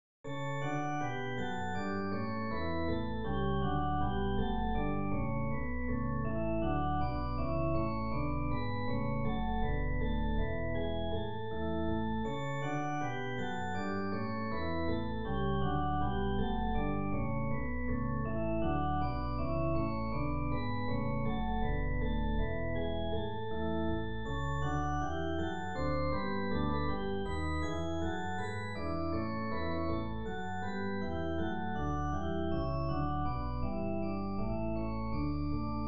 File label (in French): rigaudon majeur; krebs johann ludwig